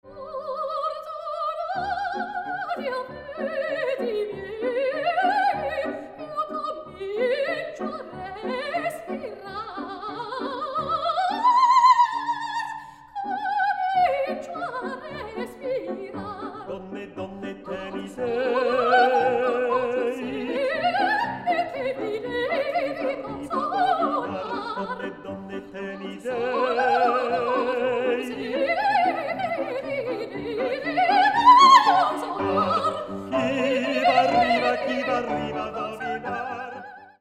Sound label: Duet; Barber